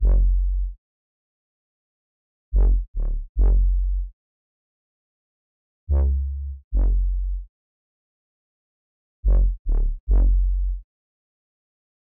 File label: 143 BPM F# Min Bass, Loop Stems; Melody; Cymatics; Cymatics - 2022 Melody USB Expansion; Vacant